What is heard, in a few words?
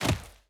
Dirt Land, Footsteps; Free Fantasy SFX Pack By TomMusic